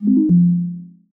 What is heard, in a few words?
Stop; VoiceInk